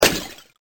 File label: GLASS BRK